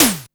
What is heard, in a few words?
SYNTOM HI; Back In Time Records; Big Bang